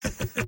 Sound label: sniper laugh short; vo; tf2; mvm